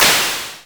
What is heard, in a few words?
Claps & Snares